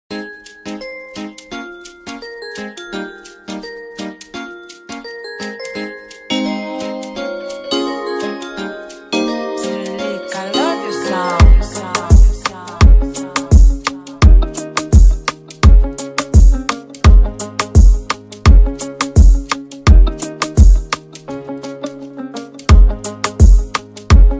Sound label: instrumental